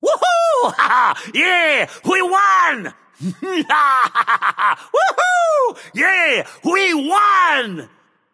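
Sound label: non verbal yay, voice; cernunnos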